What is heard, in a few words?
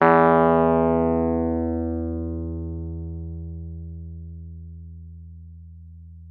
WURLITZER, E2; Xsample VOL-1; 90 s Sample CDs; WURLITZER RS